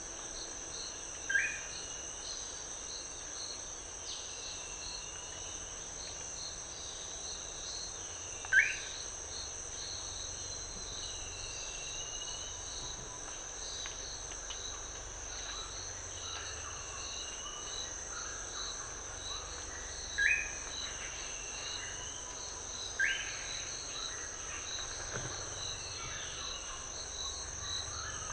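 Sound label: Manakin, bird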